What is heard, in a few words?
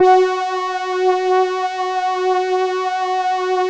LAMBDA, String Machines